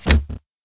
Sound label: crossbow fidget; sci arms